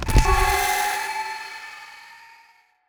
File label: Walker Death